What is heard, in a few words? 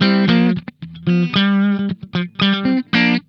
INTERVAL, Funk Guitar; Zero G - Funk Guitar